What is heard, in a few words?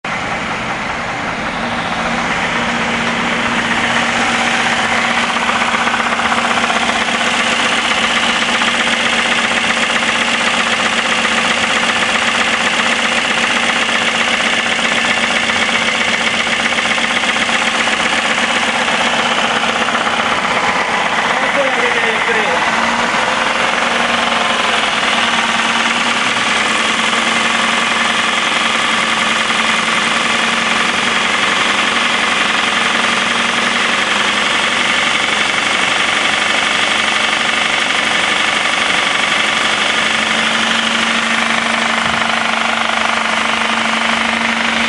engine noise e350 4m; e-class w212